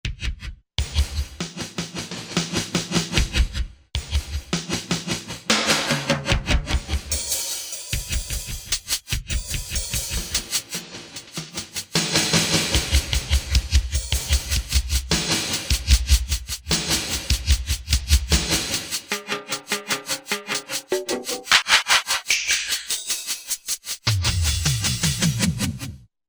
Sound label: 3D Drums, Korg MSC-11S Brass